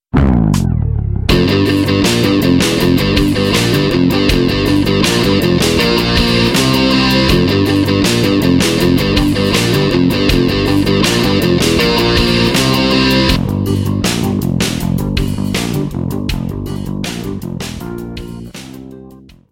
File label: Riff